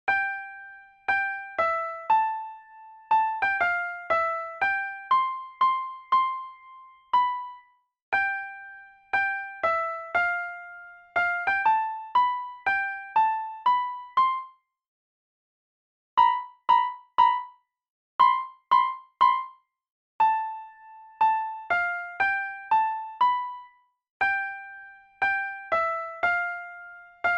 Click Go Shears Duet

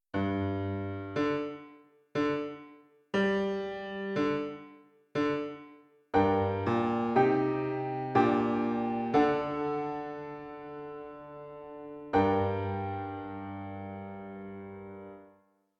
Piano

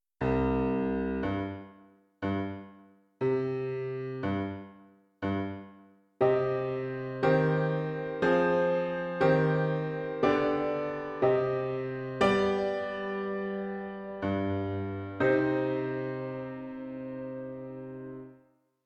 Piano

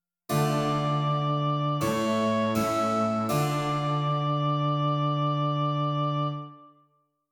continuo, dictation

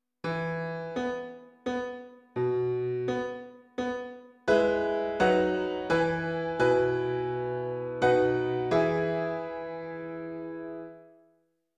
Piano

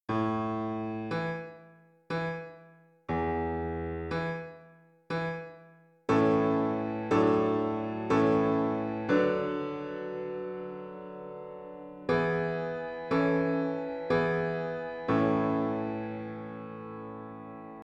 Piano

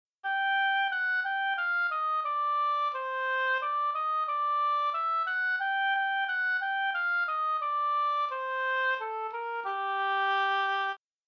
Melodic, scales Minor

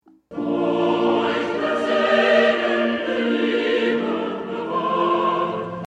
Bridal Chorus, intervals Maj

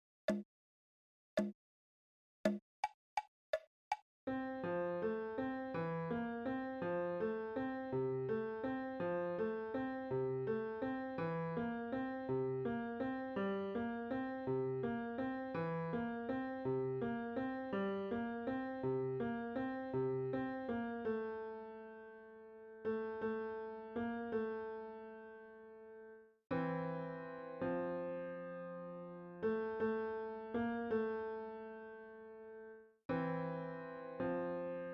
Voici Venir left

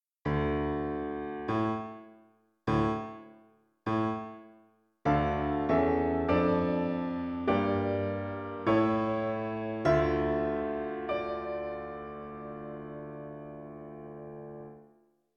Piano

